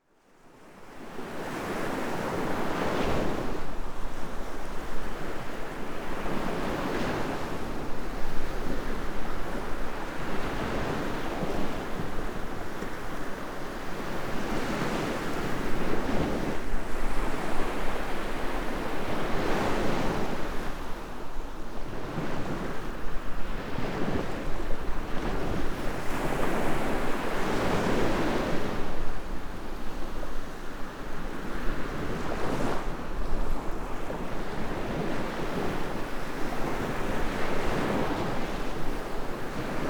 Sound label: Sea Waves Rough Sea Large Waves Stormy